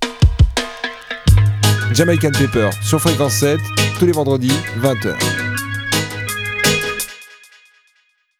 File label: jingle